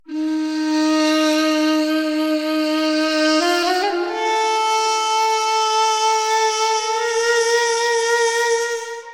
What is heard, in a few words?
E MIN FLUTE, Organic House / Deep House Sample Pack Oriental & Middle Eastern; Azura – Organic House Sample Pack